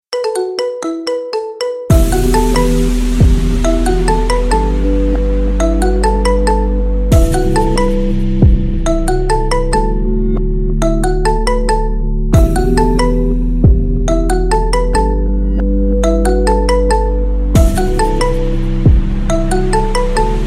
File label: marimba remix